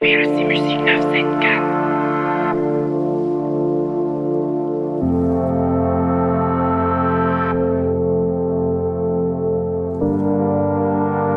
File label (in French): Ragga Dancehall Séga; Ambiance Animation; PLC Muziks 974